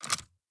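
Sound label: Reload Movement; Valorant; ares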